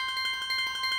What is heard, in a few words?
Coin Count Loop; netent